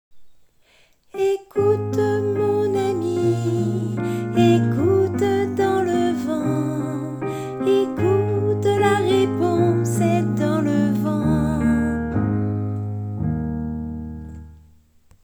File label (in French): soprano